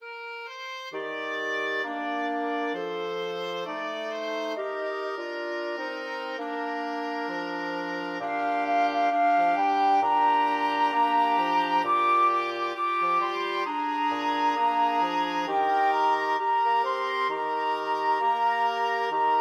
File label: flexible mixed ensemble, 4 players